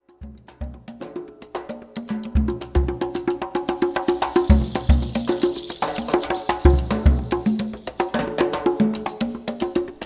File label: groove